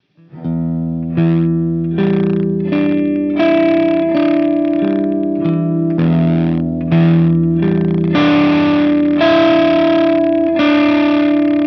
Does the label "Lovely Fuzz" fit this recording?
Yes